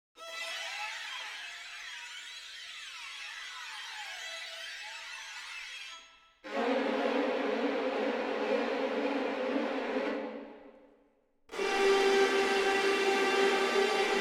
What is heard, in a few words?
Albion Effects